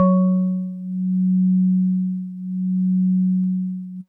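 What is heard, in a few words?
House Organ DX100 F#6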